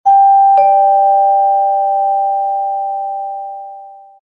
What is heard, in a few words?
vibes high two note doorbell